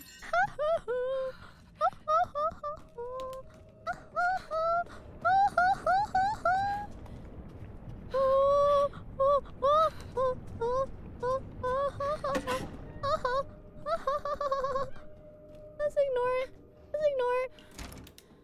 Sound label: despair noises